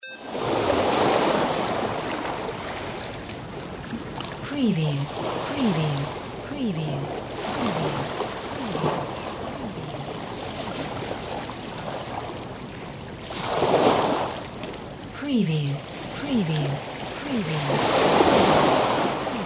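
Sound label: WATER SEASHORE WAVES; windy beach audio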